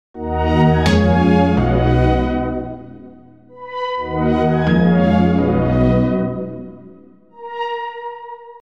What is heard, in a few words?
KEYS Sky